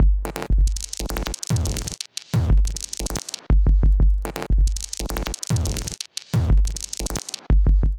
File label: Onomatopoeia Kit, drums; Ableton; Glitch and Wash